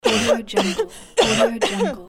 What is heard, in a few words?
female cough